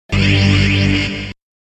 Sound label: open laser